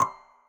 synth

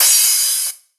Crash

